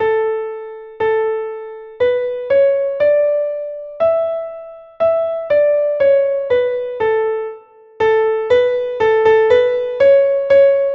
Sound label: violin